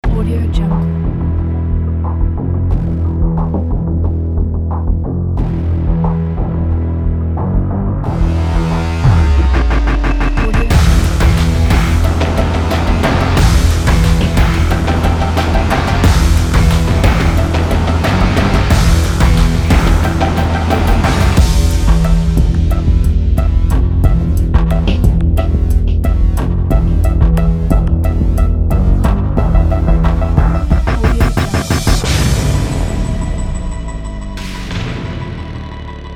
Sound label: power trailer